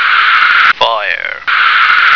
fire

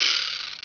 RATTLE

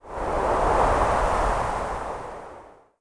WND GUST

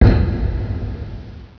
echo, cave

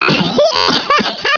G LAUGH; GUBBLE

